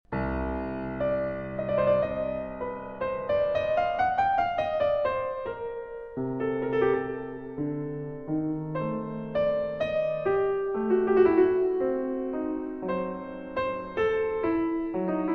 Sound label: Freie Fantasie fürs Clavier in F-Sharp Minor, Adagio